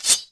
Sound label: Sword In; Civ4 warlords